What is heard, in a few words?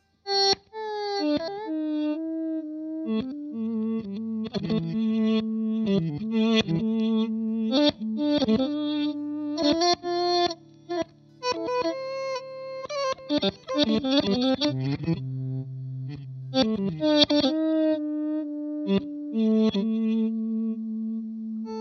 Reverse direct